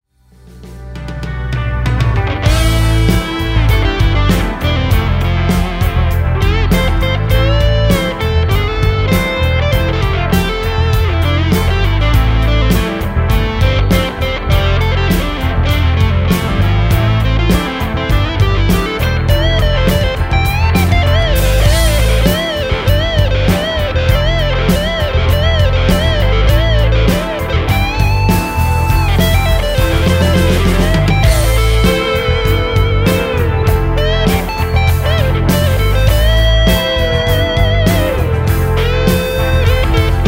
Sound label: les paul; mercuriall harlequin